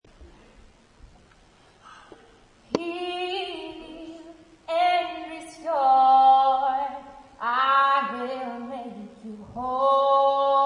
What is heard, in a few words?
Prophetic Song; Prophecy in Song; hearing from god prophetic conference